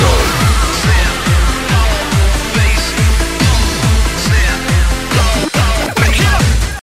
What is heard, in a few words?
Pump Bass Sound